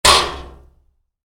Wood hit aluminium heavy, Wood, Impact: Aluminum, Heavy; Materials; Wood Sound Effects; Stuart Duffield